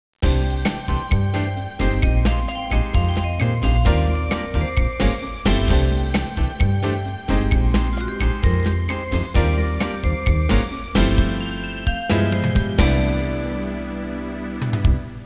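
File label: bossa nova; QChord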